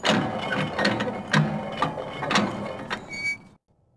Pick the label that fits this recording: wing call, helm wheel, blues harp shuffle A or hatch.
helm wheel